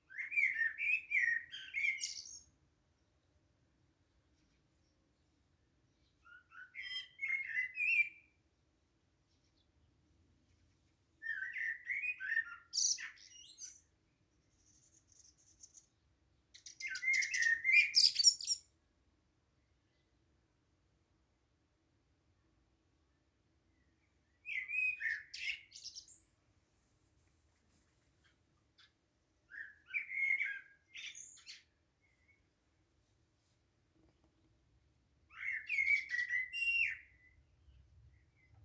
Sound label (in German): Vogelgezwitscher